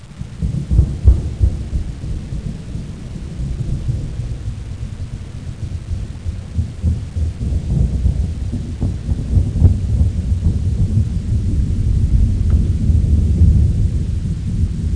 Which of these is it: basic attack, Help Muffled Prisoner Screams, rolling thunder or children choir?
rolling thunder